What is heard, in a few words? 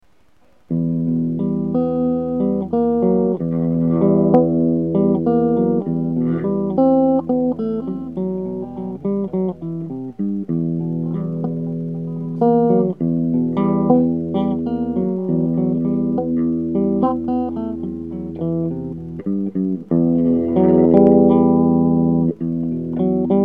Guitar Riff